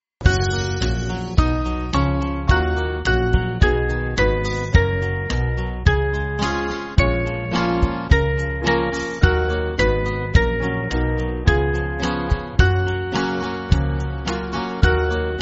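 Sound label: Band, Music Backing; Father We Thank Thee; Rendez A Dieu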